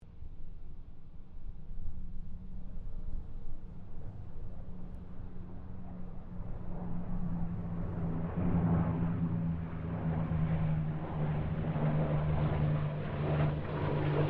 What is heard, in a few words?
AIRCRAFT SPITFIRE MUSTANG KITTYHAWK PASS DISTANT; Mixed aircraft squadrons; Airplanes (Sound Effects:Vehicles)